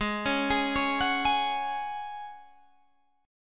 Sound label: Db Suspended 4th Ab